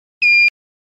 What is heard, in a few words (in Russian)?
Запись удаление одиночный